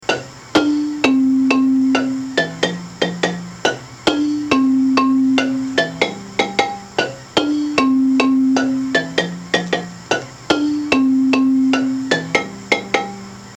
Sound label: bass ending, marimba; manhanga